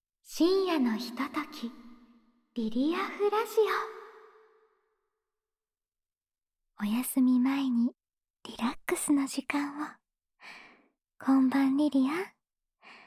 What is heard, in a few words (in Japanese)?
雨音・シチュエーションボイス; 私、雨の音って好きなんです。; VTuberに転声してみた 〜上田麗奈→リリア・フランジオ〜; 超ウィスパー